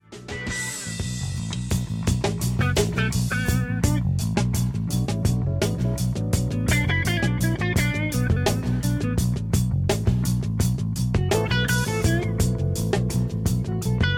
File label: leslie